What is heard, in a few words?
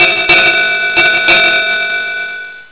SHIP BELL